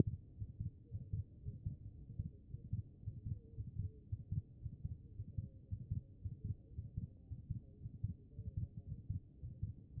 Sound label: HEART; B MODE